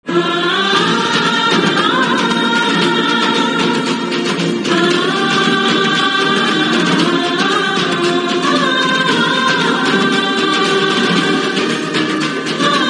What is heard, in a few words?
Aa Aaa Female Version